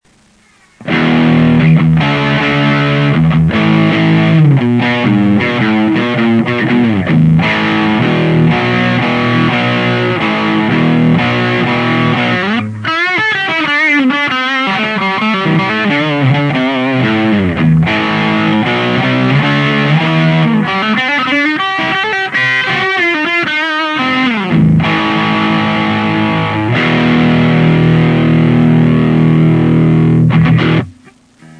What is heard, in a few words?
jcm800